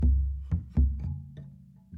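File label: Calm Far, music; from dust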